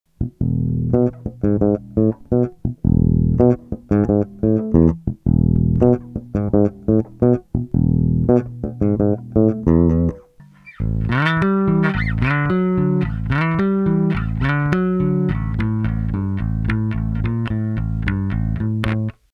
DI out

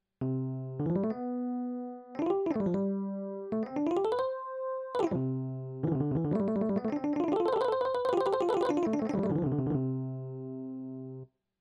Touch Scale; Triton Taktile

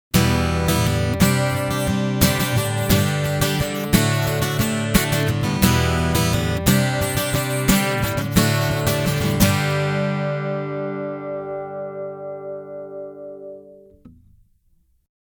Boss Resonance EQ Comp Chorus

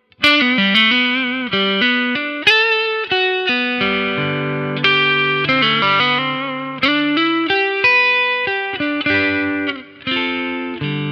Clean Front HB

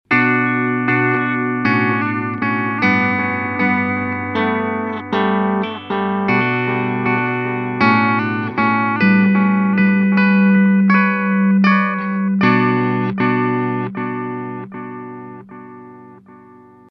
Tube Tape Echo Low Speed; Fulltone TTE

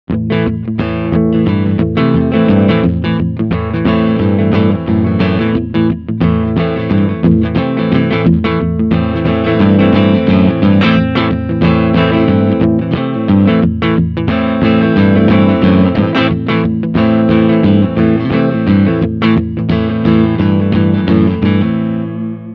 Clean Fronthumbucker

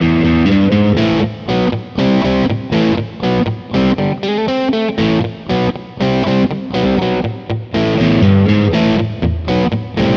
Godin Session Strat Bridge Position 2

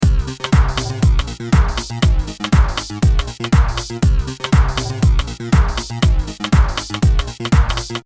beat Flat

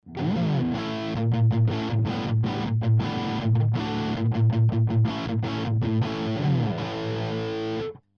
rhythm; C414

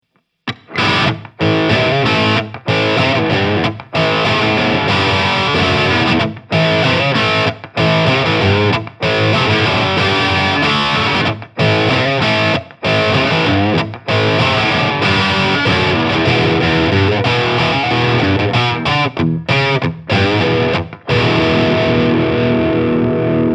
LTD MG 1001 Crunch

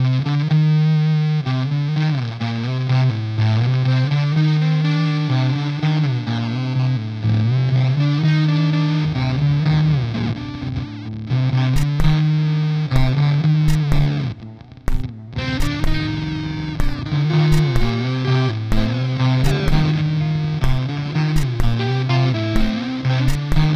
EHX Mainframe

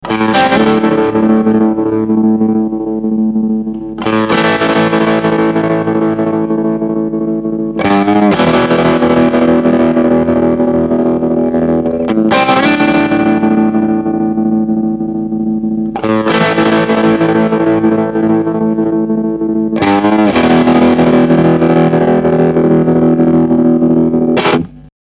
Varimolo

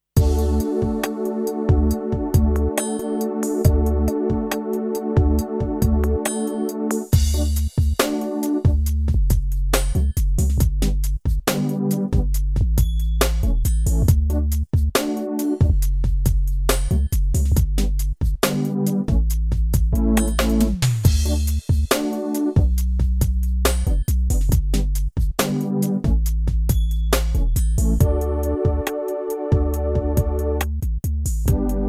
Korg i3 Style

